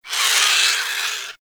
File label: Avichaea Vocal Death; Hunting Critters